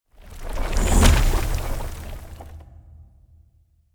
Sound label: Stone Door Shuted